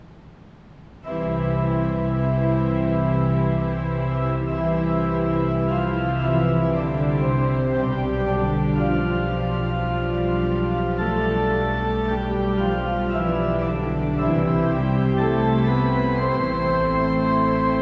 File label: Andante from Violin Sonata No. 2; Bach